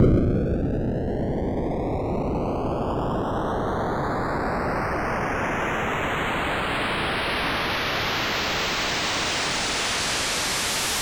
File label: White Noise